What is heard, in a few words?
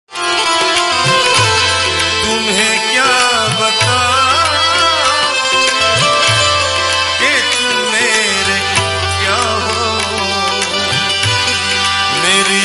sad hindi